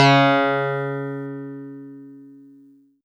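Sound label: Easy Clav DX100 C#3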